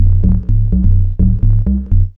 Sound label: B LOOP; E-Lab; X Static Goldmine 1